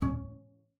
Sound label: C#4, bass pizz; Xsample VOL-5